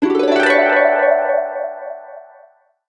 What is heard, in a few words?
chariot lyre; smite; Apollo